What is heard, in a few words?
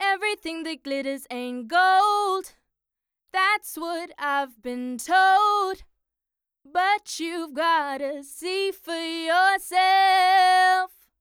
71bpm E blues scale; Edexcel; A-Level Music Technology 2017